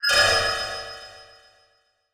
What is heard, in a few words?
Transition Bell Hit; Adobe; Production Element